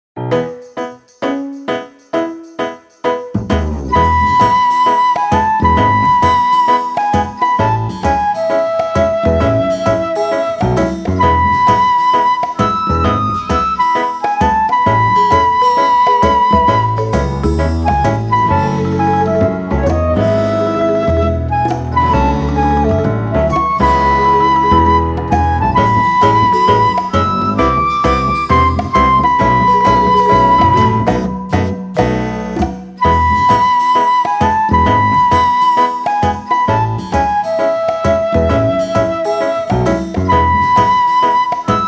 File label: Music Menu event